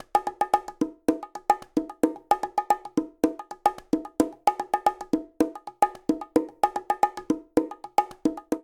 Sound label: Funk Bongo 111bpm; Latin Percussion; Funk 2; Ableton; Factory Packs